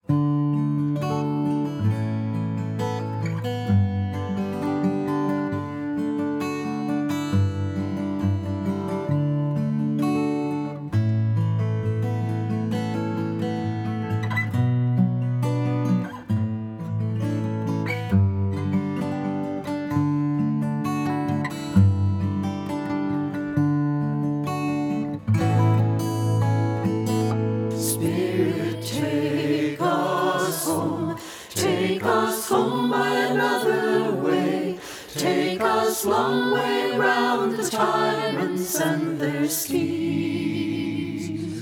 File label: Take Us Home First Verse with Guitar Intro